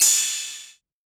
crash, cymbals, drum one shots; Crashes; Cymatics; Cobra Hip Hop Sample Pack

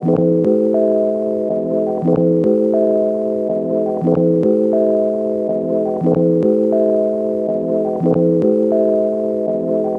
Tape Loop Melody A; Tape Loops; Melodies; Cymatics - Eternity Sample Pack

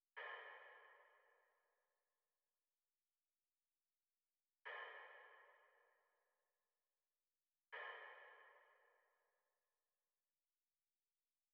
Drum Loop, 127 BPM Percussion; Loop Stems; Cymatics; Vibes II Drum Loop Collection; Matriarch Drum Loop

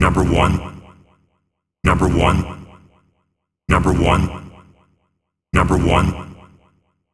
Vocal Loop 130, Techno; Techno Sounds 2024; Vocals

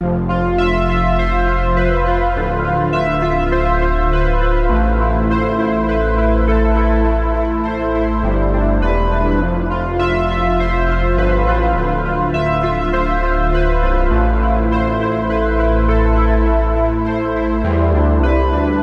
102 BPM A Min (OBX+P5+MM), Fantasy Synth Loops; Cymatics; Fantasy Synth Sample Pack; Final Destination

